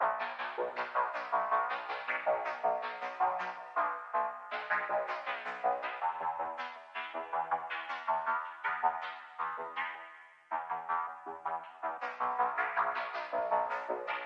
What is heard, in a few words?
Rhythm Synth